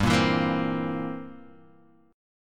C 7th G

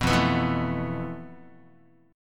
F Major 7th Flat 5th, Guitar; strummed; JGuitar

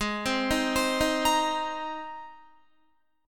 Ab Major Add 9th Ab, Guitar; arpeggiated; 15,15,15,17,15,18; down; 4F,4A,5C,5G,5C,6E

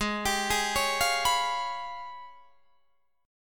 G sharp Minor Major 13th, Guitar DADGAD; G#mM13 arpeggiated; 18,22,18,18,20,21

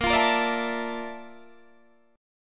A sharp Major Sharp 11th, Guitar, Standard; A#M#11 strummed

